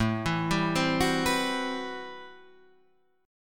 A Minor 13th, Guitar, Standard; Am13 arpeggiated; 5,7,5,5,7,7; JGuitar

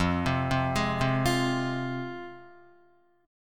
F Minor F down Guitar; arpeggiated; JGuitar

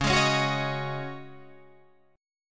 Db Suspended 2nd Suspended 4th Db Guitar Drop D

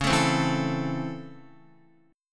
Db Minor Major 7th Sharp 5th Db down Guitar; DbmM7#5 strummed; JGuitar

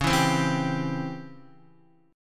Db Minor Major 7th Flat 5th Db Guitar, chord

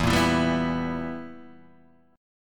B Major 7th Suspended 4th Sharp 5th E

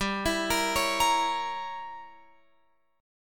G Diminished 7th, Guitar, Open F; Gdim7 arpeggiated

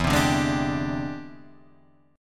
E 7th Sharp 9th Sharp 5th, chord

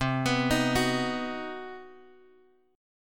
C Minor Major 7th Flat 5th chord; CmM7b5 Chord {X 3 X 4 4 2} arpeggiated

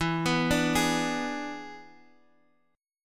E 7th E down Guitar Half Step Down

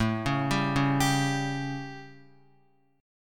A 7th Guitar, chord; arpeggiated; JGuitar

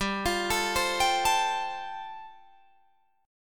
G 7th Suspended 2nd Suspended 4th, Guitar Drop D

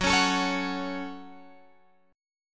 Gb Minor, Guitar, Drop D; Gbm strummed; 16,16,19,18,19,x

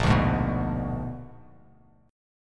A sharp 7th Flat 9th A sharp down

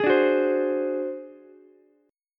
Ab 7th Flat 5th Ukulele, chord; Ab7b5 strummed; JGuitar

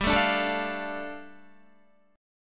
G 7th Suspended 2nd, Guitar; G7sus2 strummed

